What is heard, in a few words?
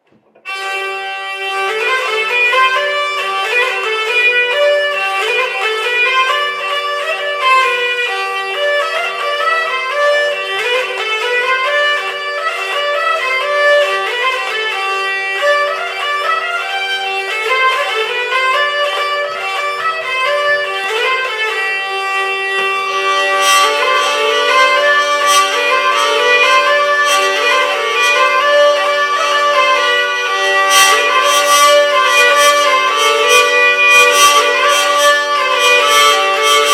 hurdy gurdy